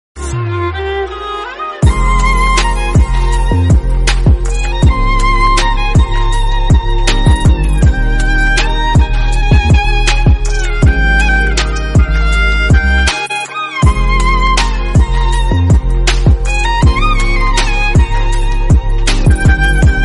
bansuri flute

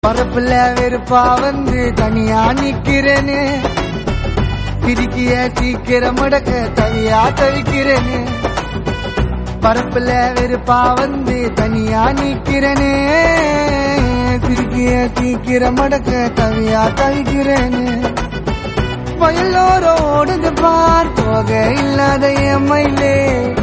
gana tamil song

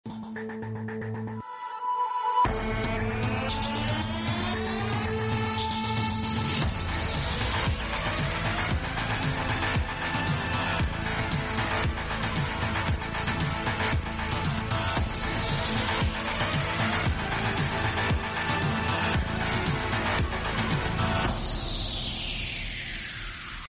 instrumental sound; true beauty

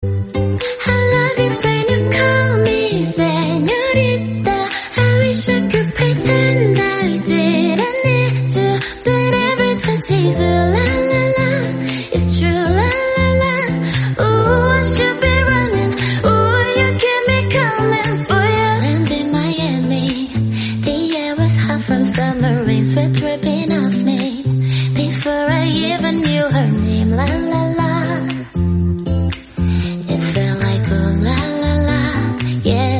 cover, English song

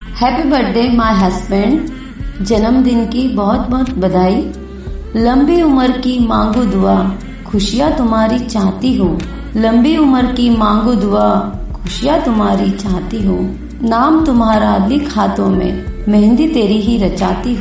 happy birthday song for little girl

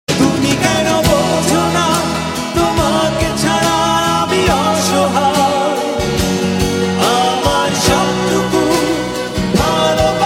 song, bengali

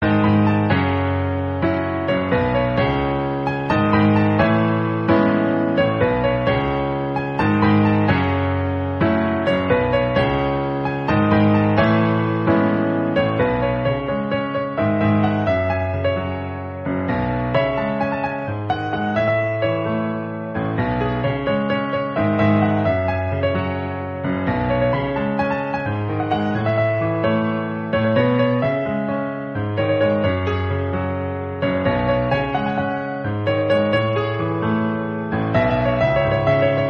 piano

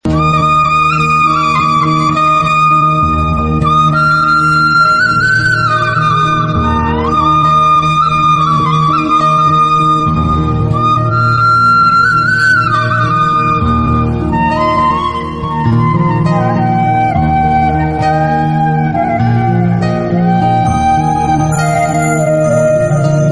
malayalam flute

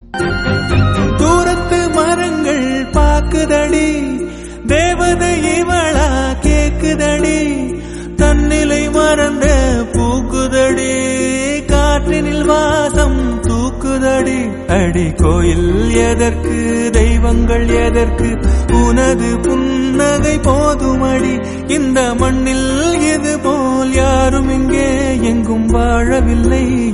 telugu song

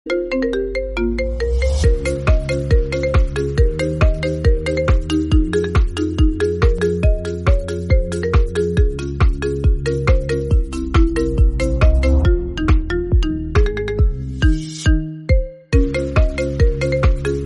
iphone instrumental remix

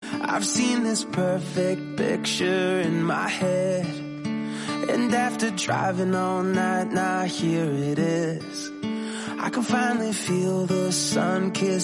acoustic version

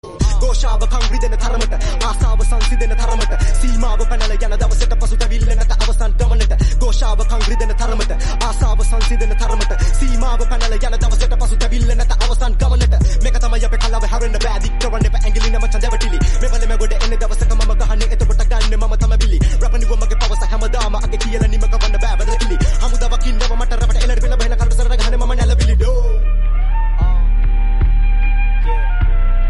remix gangster song

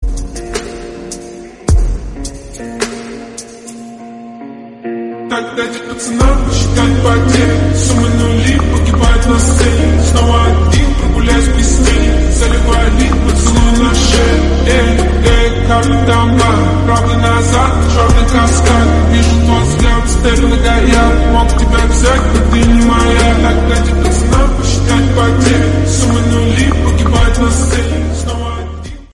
remix, russian song

